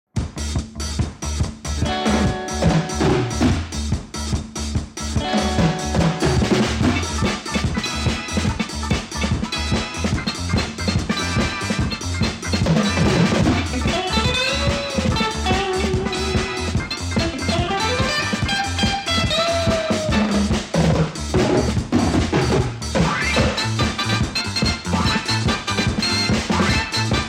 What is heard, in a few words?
Background Score; Vijeta